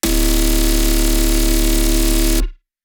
sustain freeze, bass

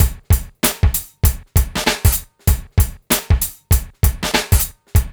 DRUMS, Funky Ass Loops

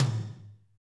TOM, KIT Real-Kit; Real-Kit s12

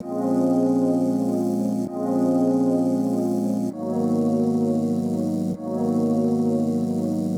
Epian Loop 130; Equipped Music; Loungin House

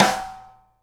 SNARE, Live Snares

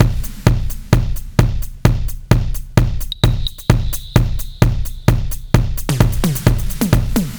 Beat Core; Zero-G; Future Wave; Beats

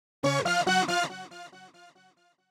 Juno Riff Db E Gb F, 140bpm, Grime; Zero-G Sound Sense Grime Scene; Construction Kits; Astoria

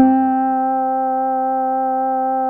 Guitar C3, Fairlight CMI IIe; Guitars

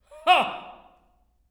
LAUGH, OP. LAUGHS; Spectrasonics; Symphony of Voices

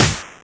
Snares; Vengeance - Essential Clubsounds Vol.4

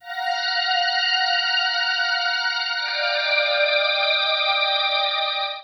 DC Eerie Pad 172, breakcore; voxandchords

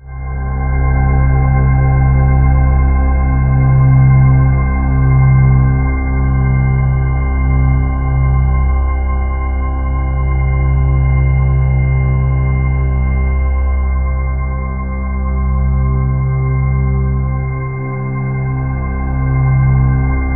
MORNING, Ominous Drones, SYNTH SND; USB Soundscan vol.48; AKAI

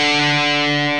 GUITAR, POWER GT

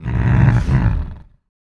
VOICE FX, Dance Vocals

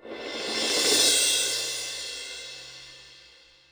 Akai XR-20, Drum Machines, Cymbals

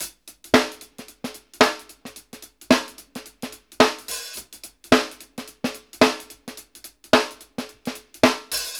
16TH SHUF, drumworks; Sampleheads; New York City Drumworks VOL-1

